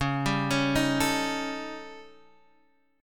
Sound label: A 7th Flat 5th Db